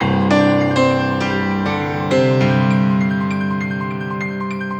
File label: Artrock, keys